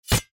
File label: single Hit